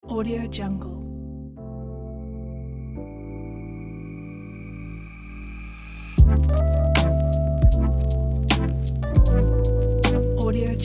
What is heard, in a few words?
neo soul